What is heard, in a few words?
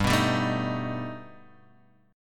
B Augmented 7th G